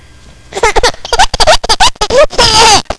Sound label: laugh